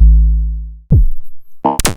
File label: EMU Modular